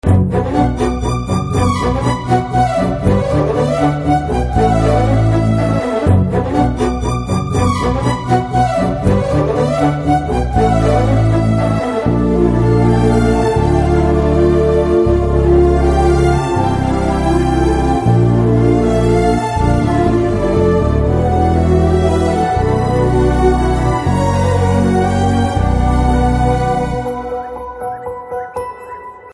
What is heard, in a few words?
BGM Telugu Ringtone